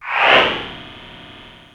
FX, Drum & Bass

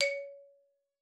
XYMF C#4, XYLOPHONE; Xsample VOL-4

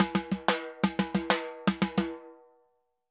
Timba Poly; Best Service; Festa Latina VOL-1; Drums & Percussion

